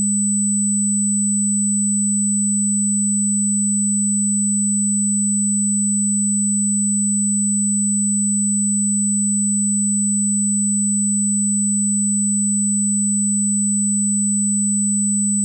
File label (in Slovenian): dva tona